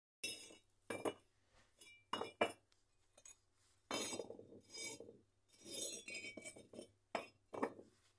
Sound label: brick